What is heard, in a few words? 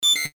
future communicator signal